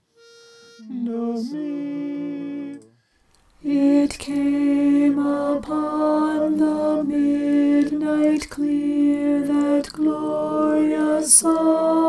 alto

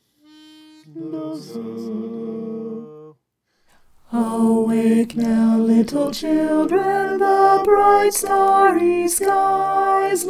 alto

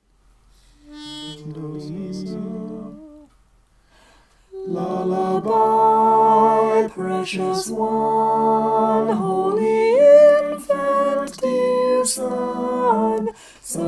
soprano

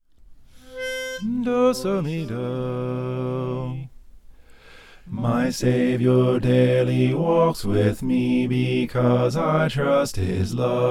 bass

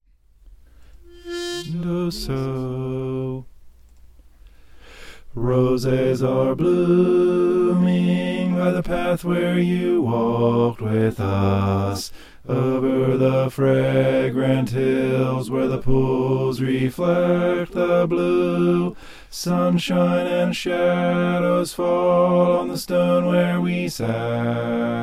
bass

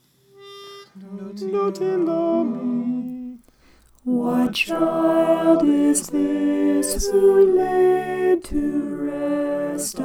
alto

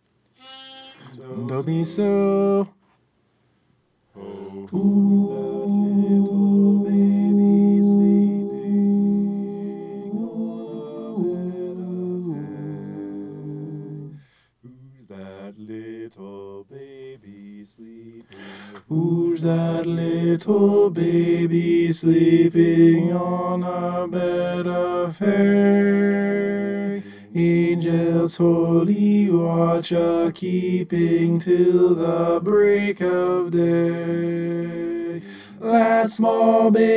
tenor

